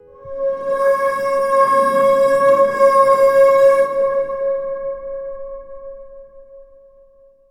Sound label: horn